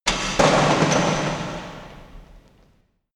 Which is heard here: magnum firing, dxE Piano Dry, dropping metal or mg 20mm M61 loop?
dropping metal